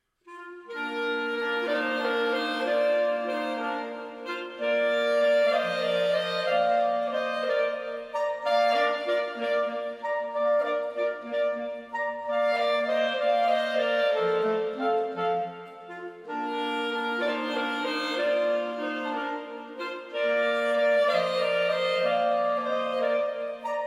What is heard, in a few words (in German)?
Hirtenmusik Klarinettentrio; aus Breitenbach